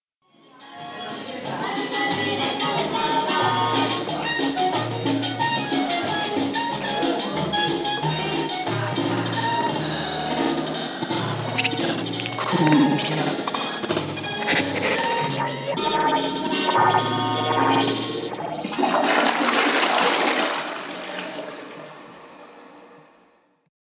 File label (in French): crea sonore cumbia